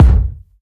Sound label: fresh rap kick drum one shot b key, Royality free kick sound tuned to the B note